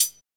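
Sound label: hi hat; HH-Kits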